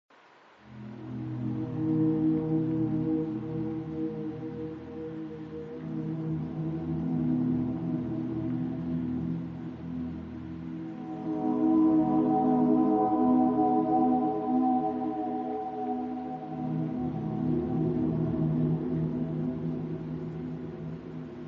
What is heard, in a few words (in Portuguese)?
fundo musical suspense terror horror themes musicas de terror soundtrack